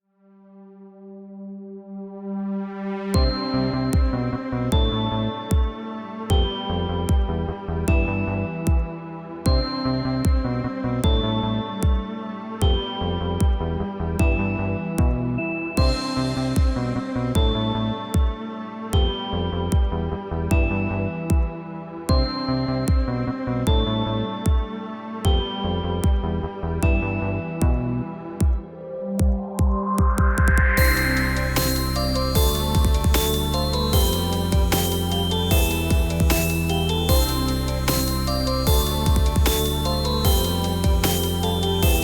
Electro Ambiental; Pony Music Archive

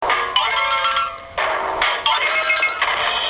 boomp run

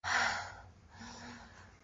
Breath; Lewd SFX

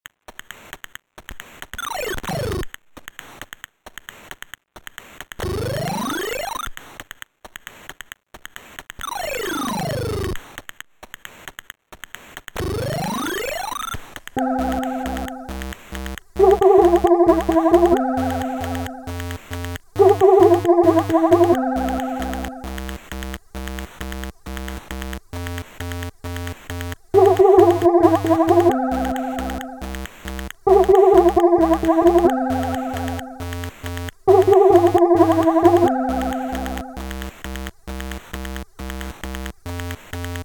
Chiptune; underscore album

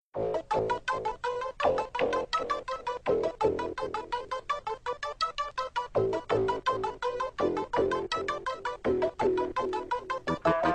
Raps Fast, rap, short

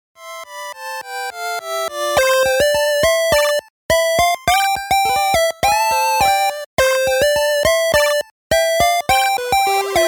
8-Bit

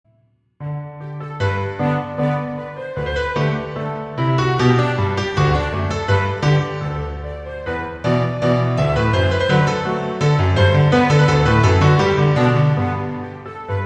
Orchestral Version

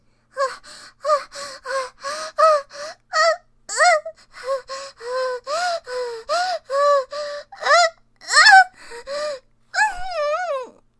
Moans, Moaning; SUPER Short Audio

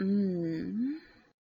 Moan

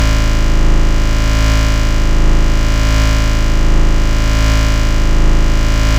Tone; Generated Tones; a sounds adventure through the intranet

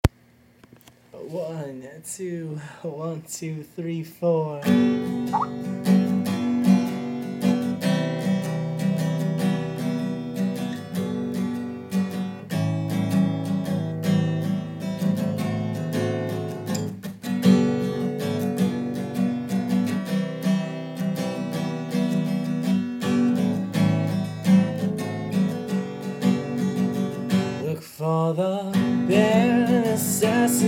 jungle

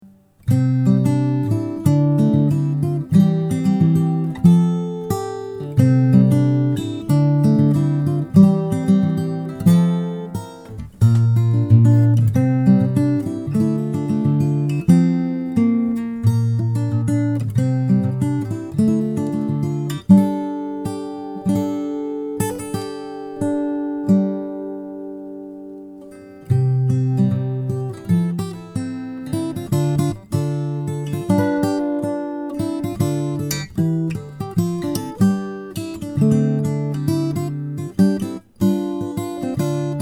Acoustic Instrumental; Rock

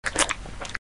Suck; Lewd SFX

